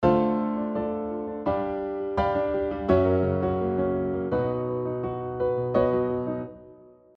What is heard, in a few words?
augmented sixth mod